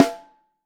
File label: Snare Top